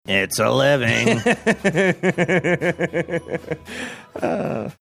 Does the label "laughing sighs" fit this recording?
Yes